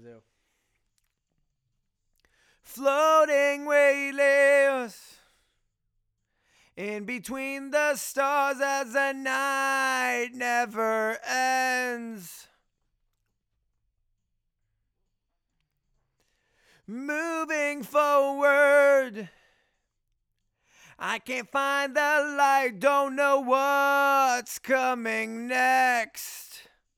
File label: Chorus